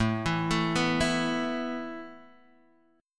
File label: A Suspended 2nd A Guitar DADGAD, chord